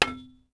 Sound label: pipe hit wood; impacts explos